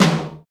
TOM, KIT Real-Kit

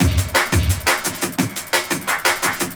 Darkside Break, breaks; m8 breaks

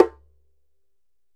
DJEMBE, African Drum

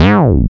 jam synth, E keys

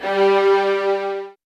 SOFT VIOLINS

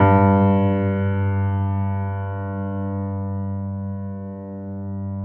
PED MF, GRAND PED; Best Service Advanced Media Trax Modern Composer

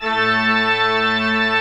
MANUAL A2, Pipe Organ; E-MU Producer Series Vol. 2 – More Studio Essentials; Keyboards

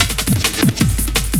LOOP SD, Drum & Bass, 170 BPM; Ueberschall; Drum & Bass Flash Trackz

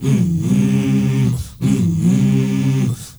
MALE HUM; M.HUMMERS; Spectrasonics; Heart of Africa

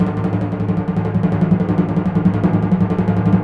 TMP A#, Synclavier Percussion

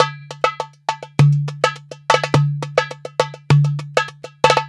MEH1 PERC, Balkanish Traditions

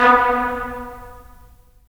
BRS, Tps Stacc; Roland - LA Composer vol. 4

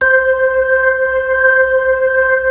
B-3, Club; Roland LCDP10 Keys of the 60s and 70s 2; Club Ch. Xfde

